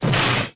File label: vintage cartoon crash; Cartoon Sound Effects Kit